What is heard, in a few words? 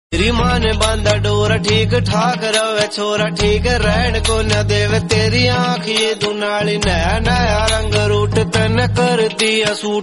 bollywood ringtone; hindi ringtones